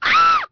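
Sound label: female scream; People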